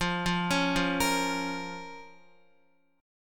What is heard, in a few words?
F Suspended 4th Sharp 5th, down, Guitar; arpeggiated